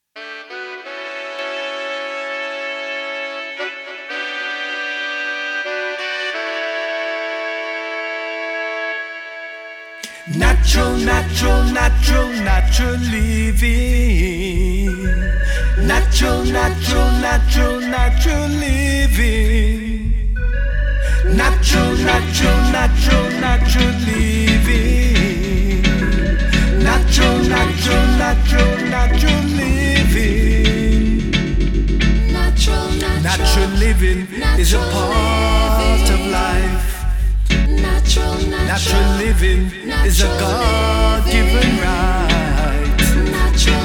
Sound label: Natural Living Dub